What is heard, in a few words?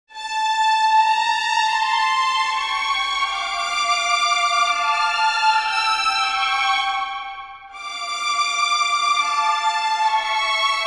Divisi all